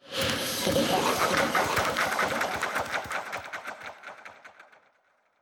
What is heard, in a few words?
intro laugh